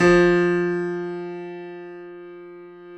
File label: F 2, YC7 Piano mf